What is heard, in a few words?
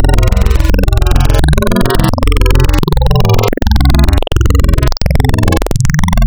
Spectral, wavetables; Architecture 32